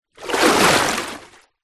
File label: vehicles boat gondola oar movement water; zapsplat